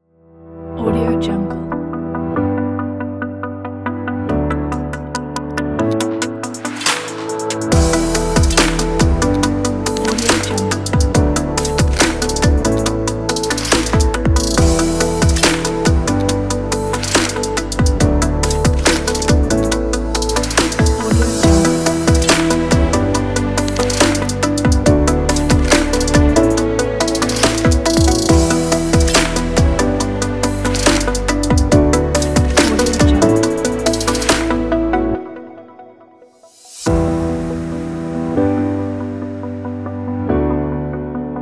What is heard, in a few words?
future technologies